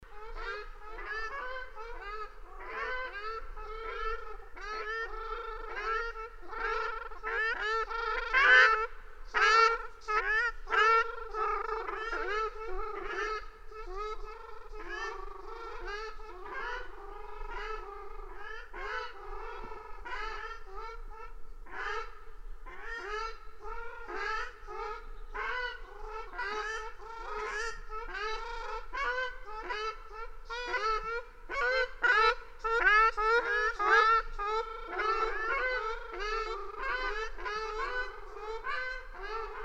Ruddy Shelduck Tadorna ferruginea, display calls, 6 individuals, Dzhukey, Kazakhstan